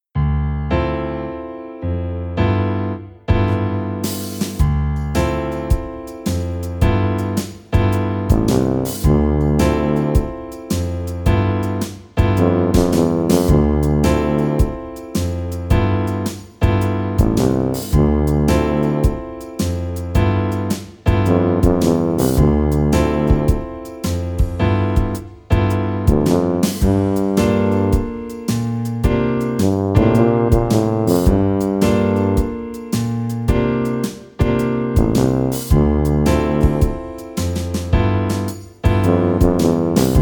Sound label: Tuba SWING